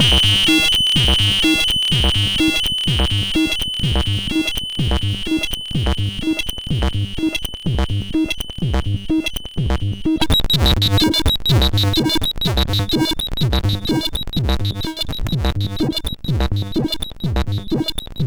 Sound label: sid MOS6581R2; Circle of Trust